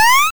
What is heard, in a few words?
Jump